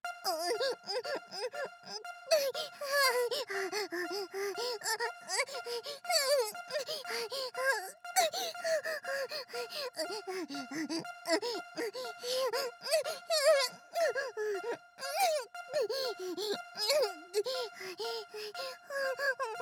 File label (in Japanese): げきはや